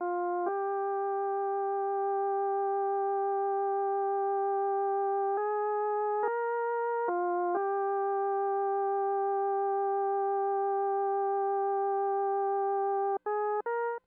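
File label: organ, C, Hip Hop & RnB; ProSamples vol.34 - Hip Hop & RnB 3; Slow Wined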